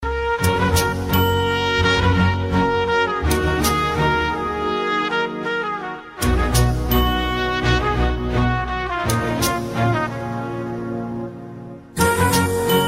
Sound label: Saxo; Thupaki